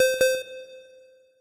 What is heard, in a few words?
PC access; Pokemon Solar Light & Lunar Dark 1.0.1